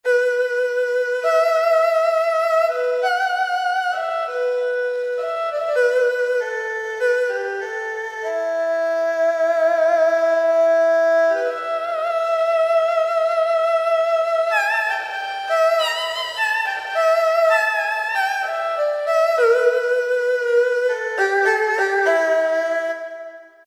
STRING - CHINESE ER-HU -violin (v-s and f patches w vibrato); Spectrasonics Heart Of Asia